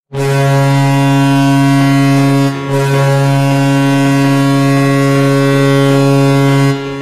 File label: goal horn; Toronto